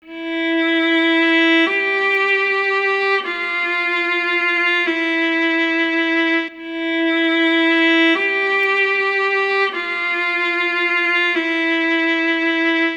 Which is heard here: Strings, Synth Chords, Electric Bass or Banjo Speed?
Strings